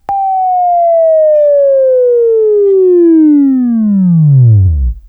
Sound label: Buzz, Sweeping Training